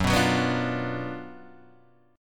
F sharp Major 7th Suspended 4th Sharp 5th, F sharp, chord; F#M7sus4#5 Chord {2 2 4 4 3 1} strummed